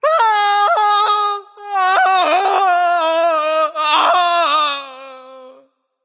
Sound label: fear; smite; loki